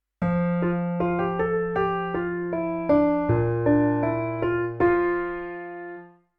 song with I IV with sd below

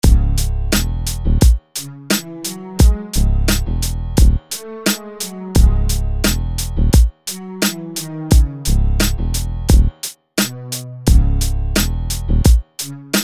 EASY EAR TRAINING INSTRUMENTAL

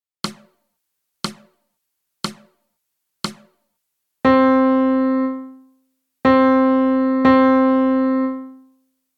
Melody; Lesson 1 Easy; Read Rhythms

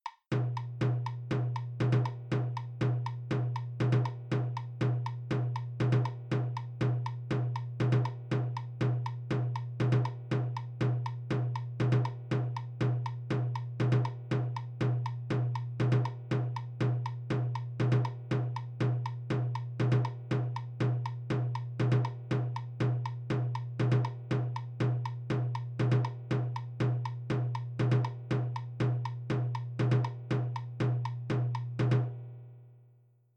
Isolated Rhythm

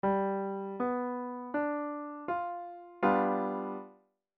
V7 Chord ii V I

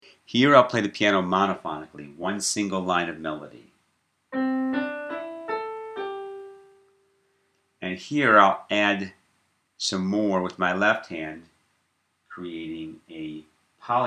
Monophonic Polyphonic

